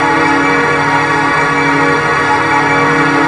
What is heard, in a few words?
A#4 voxy; Northstar - The Wizard VOL-1; VOISH